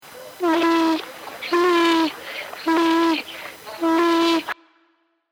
vache

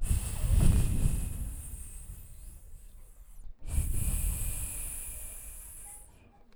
Serpent

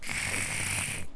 Lion discret

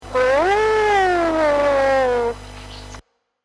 loup

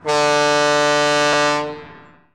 camion

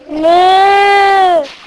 vache

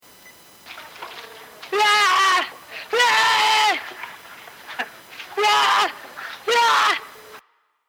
Lion

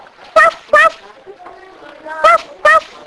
Chien

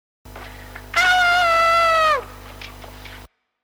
loup

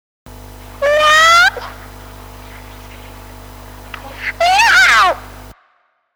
Chat

